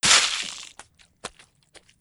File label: splatted; super trench attack 2